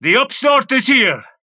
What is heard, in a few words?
ping see synth; Mirage voice line (see Pocket) - The upstart is here!